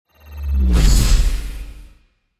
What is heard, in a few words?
Rebuild